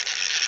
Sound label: fish reel; dota; crownfall